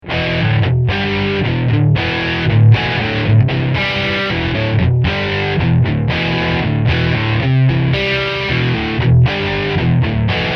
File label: Riff Front Humbucker